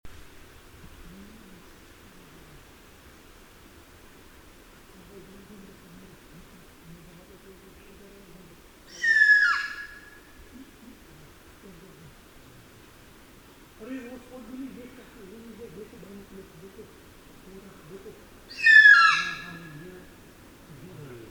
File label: Spot bellied eagle owl